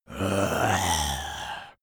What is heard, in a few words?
zombie moan idle throat